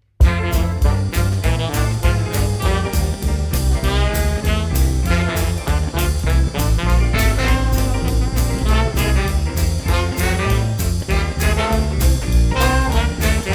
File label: Dixie hybrid